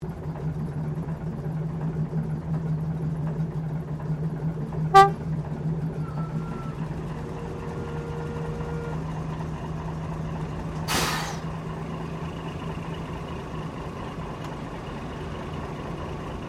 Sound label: Train Diesel Shunter VA1 Class 1947 Tasmanian Shunting